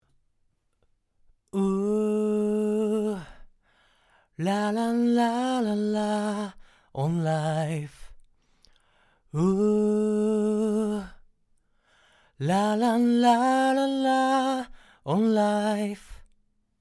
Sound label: Vocal